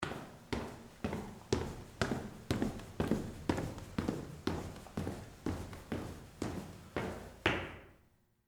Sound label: Stairwell Walk Down, Footsteps, Stairwell, Walk, Down, Reverb; BlastWave